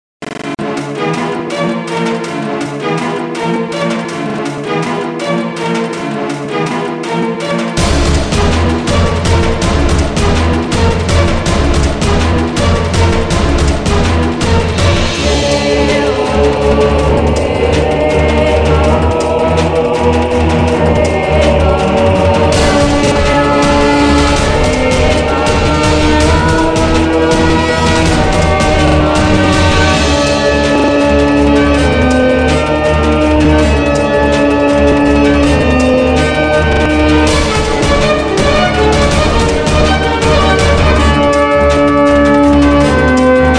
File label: Ambience Boss